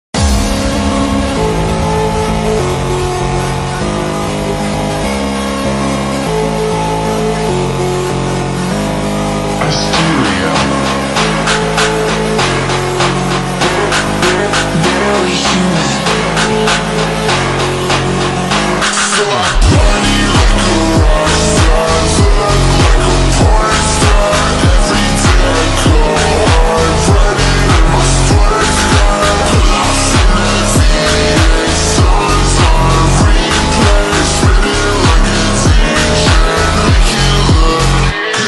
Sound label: super slowed reverb